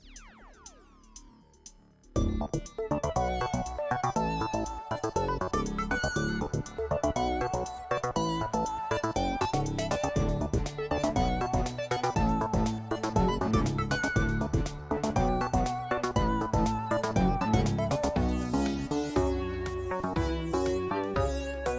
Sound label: music game